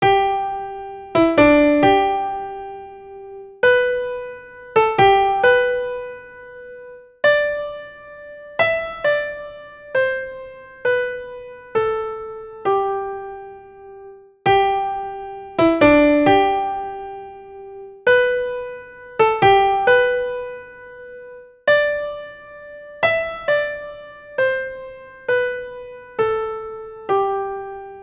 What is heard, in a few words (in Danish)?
sopran; Amen